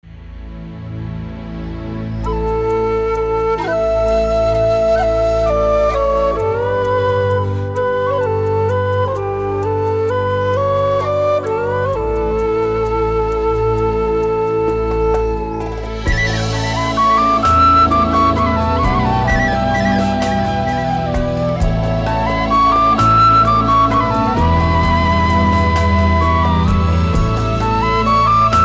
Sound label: Celtic Whispers; Millennium 4: Beyond Sunset